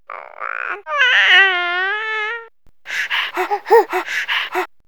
Comedy Jogging Baby, vox; vox mix; X-Static Goldmine

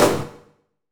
HIT 4 FX; Bolder Sounds - Granular

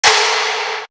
Tom, Drum One Shots; Coldcut's Kleptomania VOL1

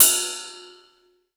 One Shot Ride, Drum N Bass - Percussion; Sonic Foundry (Sony Creative Software); Knutrix Drum & Bass

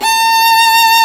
STR SOLO, String Master Series, Chamber Grp; Roland - String Master Series; Chamber Grp1

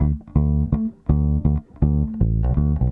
Soul Bass, 70s Analog Funk

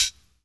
HIHAT HIP; Best Service Dance Mega Drums

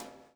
SLICK, Backbeat

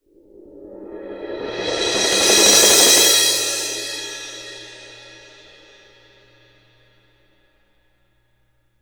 CYM, Percussion; ILIO; Synclavier Percussion 2; World & Orchestral

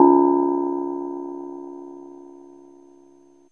SYNTH BELL; ANALOG BELLS; Ambient; Zero-G; Ambient 1

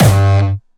Distortion Kicks Kick G2; Bassdrums; Vengeance; Essential Clubsounds Vol.4; Bonus TonalHS Kick

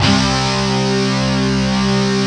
HEAVY DUT, JV-1080; Syntec; Wall of Sounds

